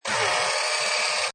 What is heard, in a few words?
Pinball Sound Motor Or Crane Move Long; Video Games